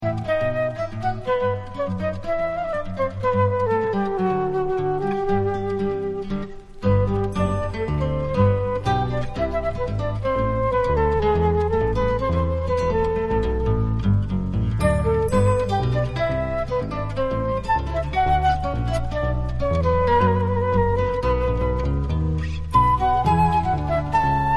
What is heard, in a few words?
BOUREE IN E MINOR